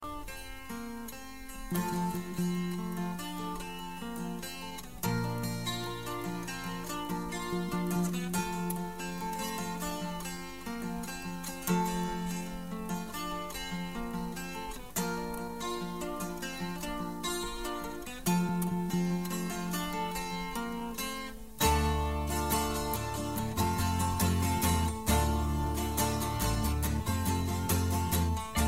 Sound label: Ethnic Sound